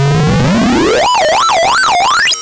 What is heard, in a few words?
VICTORY; Shadow Knights